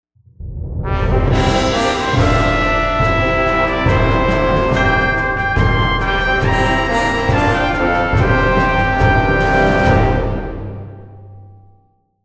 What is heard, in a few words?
Large Victory; CivCity Rome